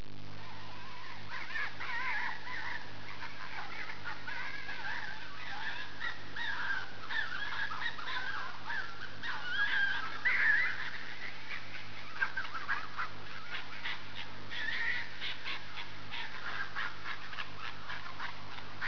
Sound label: fox agressive dog